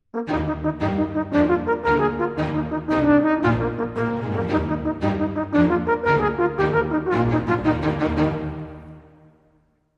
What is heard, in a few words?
Horn Opening Sting